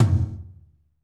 TOM, Roland; Northstar - Drumscapes Roland

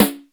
WS SNARE, drum; Crypton - DrumBase5000; WS DRUMS

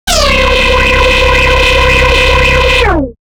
Machine Start; AMG - Prototype Drum & Bass

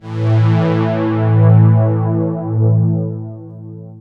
Low Analog String (single note fifth)

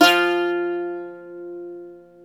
SAZ, Plucks; Eth Plucks, Pluck Duo

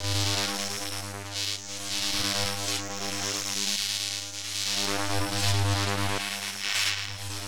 ARC SEQ, ELECT NRG; Total Control - Action Cutz

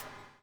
BUBBLE; Spectrasonics - Backbeat

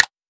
Instrument Layer; M8; percussion and foley

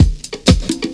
FX kit, beats; Sonic Foundry (Sony Creative Software); World Pop

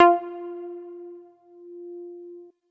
lead EP F; leads

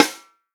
REAL DRUMS, POP KIT

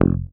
HOF MUT, Hofner mute bass

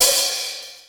XE8 CYMB, drum; Crypton - DrumBase5000

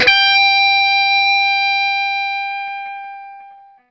SINGLE G, Vintage Blues Guitar, ELEC.GTR; AKAI; USB Soundscan vol.22

